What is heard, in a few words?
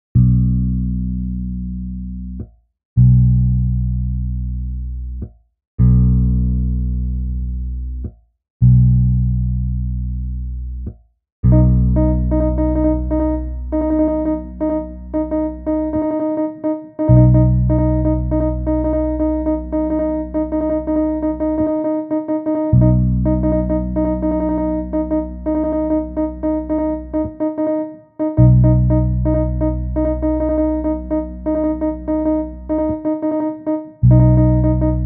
B Drone Sixteenth Thirty Second Rhythms 85 BPM; reading notes rhythms plus ear training